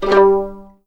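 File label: G3 pizzicato forte left, violins; East West; The Ultimate String Collection; VLNS PIZZ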